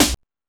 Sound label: Techno C, Drum Machines, EMU XL-7